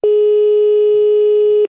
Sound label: Phone Beep Good; this is the zodiac speaking 2020 gamerip switch ps4 xbox one pc macos